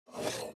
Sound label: movement Walking Wild Griffin